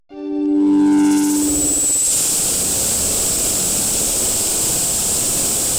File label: Woosh